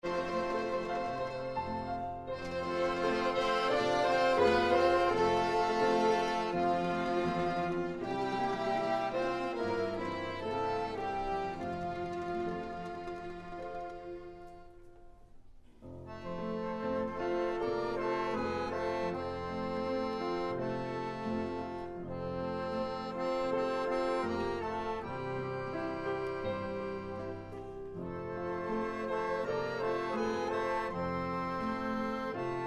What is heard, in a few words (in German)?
Orchester; Hast du keine Zeit fuer Jesum